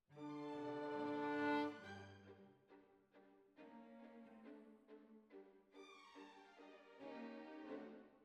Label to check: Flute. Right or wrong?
Right